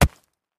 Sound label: Concrete Chunk Drop; rocks & dirt